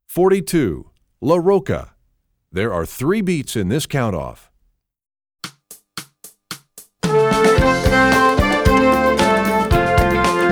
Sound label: trombone; essential elements